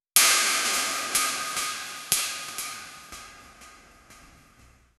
steel pan smashed with a

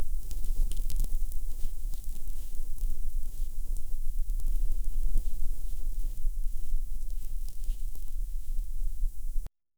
soft brittle flesh moving

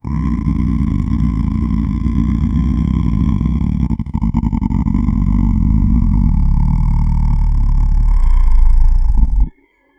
a low continuous growl vi, monster

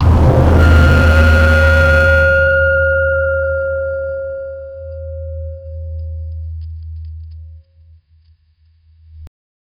a single hellish bell tol

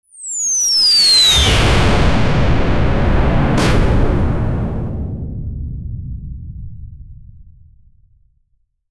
a scifi space ship shooti, weapon

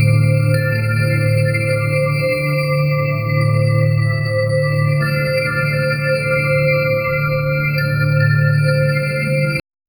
ambient, bells

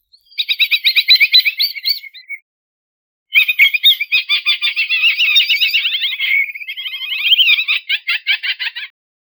light innocent giggle of, primate

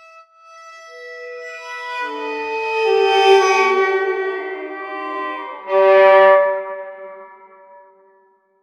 avant garde careless violin

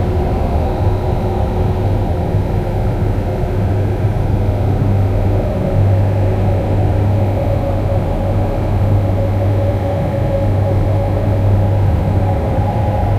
atmosphere of an intergal; laboratory

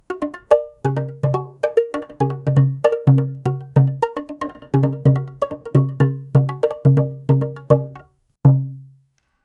a cartoonishly bad out of, out of tune

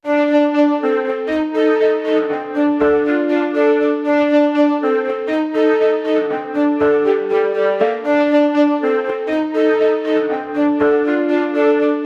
dark quirky orchestral

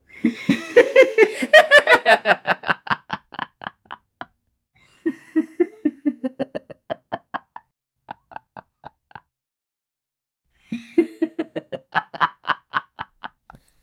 soft laugh of a couple; emotion

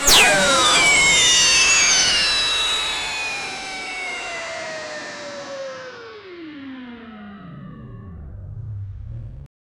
sharp energy collision

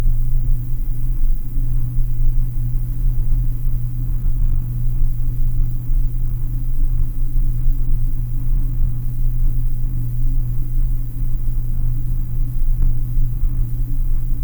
subtle suspenseful drone, scifi

